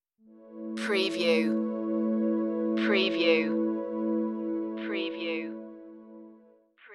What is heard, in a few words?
guitar dreams stinger